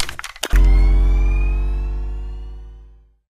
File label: laser on; Left 4 Dead 2